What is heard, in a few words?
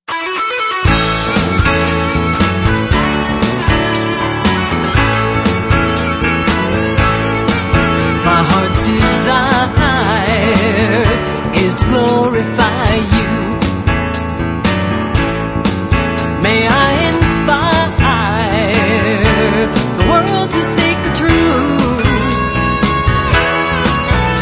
bass